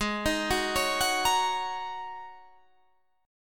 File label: G sharp 7th Suspended 2nd Suspended 4th, Guitar, Standard; arpeggiated; chord; 16,18,16,18,19,18